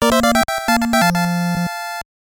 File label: Power Increase; God Slayer Haruka Naru Tenkuu no Sonata; SNK Playmore; Famicom